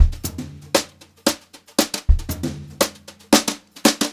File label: Brushed Drum Loops, JAZZY, 116; USB Soundscan vol.39; AKAI; 90 s Sample CDs